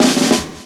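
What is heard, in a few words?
drum roll; E-Lab; X Static Goldmine 2; akai; ROLLS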